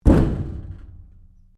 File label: Strike Metal Tank Exterior; Materials